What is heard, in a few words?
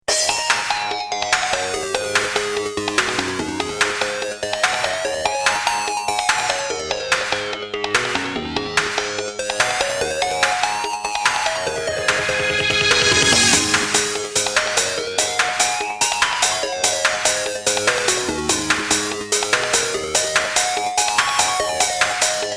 Breakneck Beats